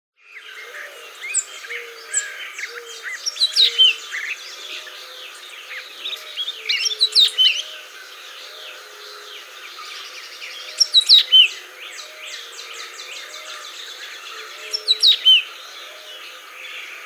baeolophus atricristatus, Sikory; sikory teksaskie; ptaki

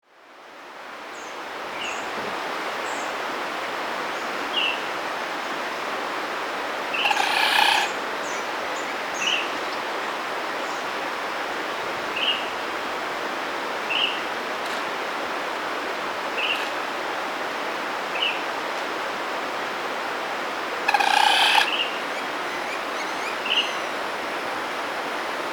smithornis capensis, ptaki; czapecznik ubogi w czasie toków

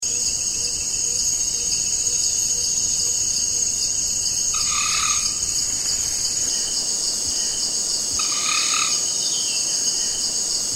smithornis rufolateralis, ptaki; czapecznik rdzawoboczny w czasie toków

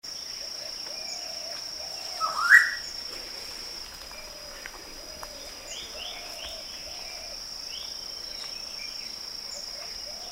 hydrornis nipalensis, ptaki; kurtaczek modrokarkowy

